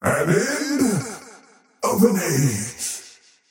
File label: death; dota; faceless void